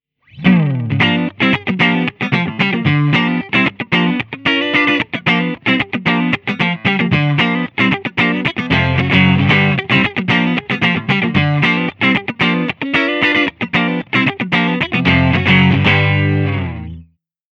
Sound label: 70s funky clean